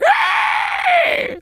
pig scream

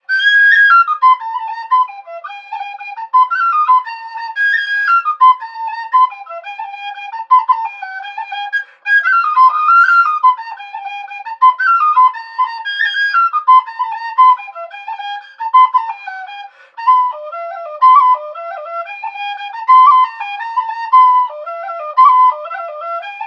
Wind Instrument; Assassin's Creed Syndicate

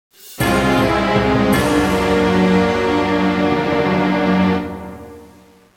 Lose; Yu-Gi-Oh! Nightmare Troubadour; DS

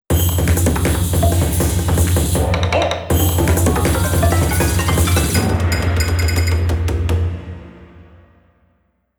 Jingle Renda

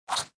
Chomp Sound; sushi cat

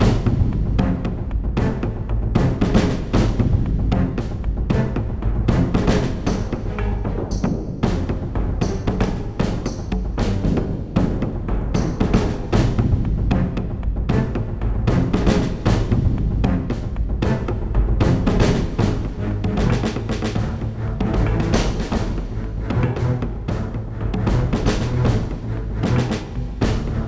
Duel Music Bad

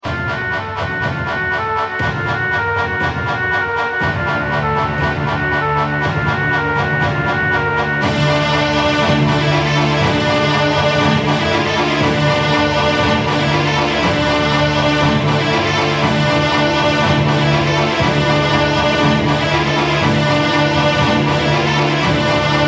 Bgm Fantasy

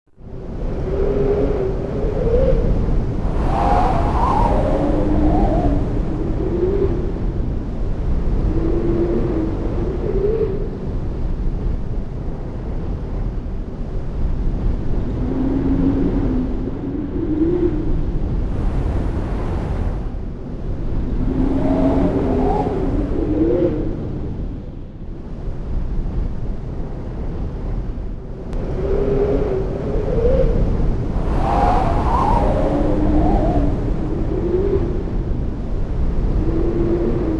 Wind (SFX); pokemon colosseum

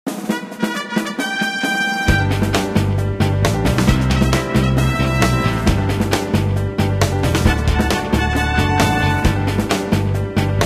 Battle Loop; guns n glory wwii android game music

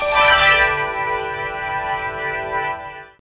Jingle Puzzle Solved; rayman 3 hoodlum havoc gc ps2 windows xbox 2003

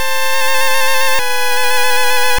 Perish Song; sfx; attack moves; pokemon; gen 2; gsc

